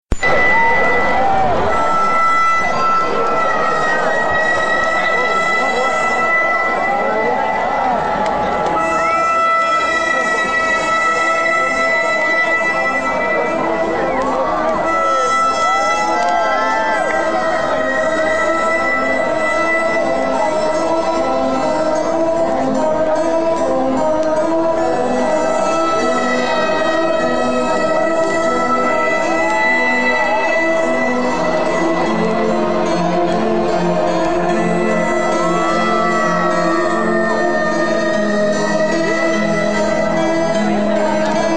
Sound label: Harmonica